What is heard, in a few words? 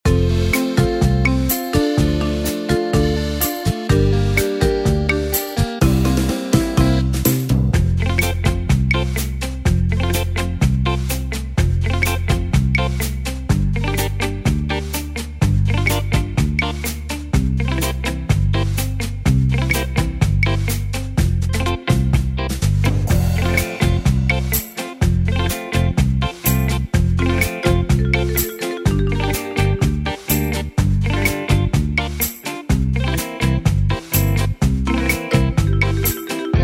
60s Brush Rumba Style